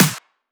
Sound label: Clap Snare; DELUXEHOUSE; Zenhiser; ENZO Drum Signature Bundle Vol 1